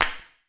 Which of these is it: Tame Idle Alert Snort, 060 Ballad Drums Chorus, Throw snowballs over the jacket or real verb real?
real verb real